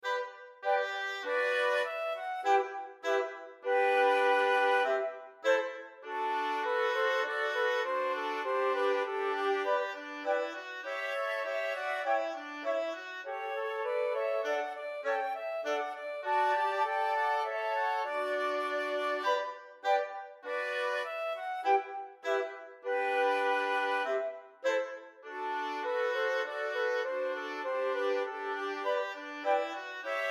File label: GAVOTTE